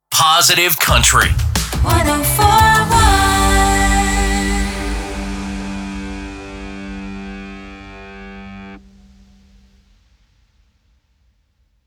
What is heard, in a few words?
Sung Jingle Positive Country 88bpm STING